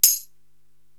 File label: Tambourine Acc., Korg TR-Rack